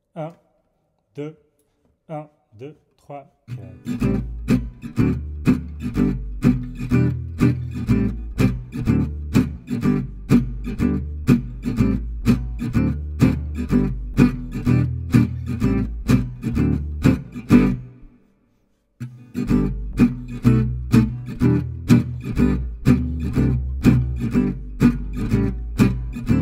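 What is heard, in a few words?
Playback manouche; MyVirtualBand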